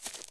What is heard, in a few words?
grass; The Sum of All Fears